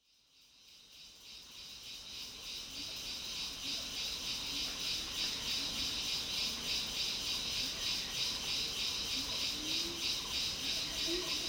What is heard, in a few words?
Malaysia Montane Rainforest, This recording was made by a small river in Taman Negara, Malaysia, and starts in the early evening; Marc Anderson; Wind Is the Original Radio